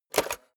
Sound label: cassette player slide tray take out medium